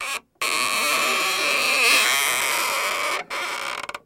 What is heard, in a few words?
Door Creak Long; hinges & creaks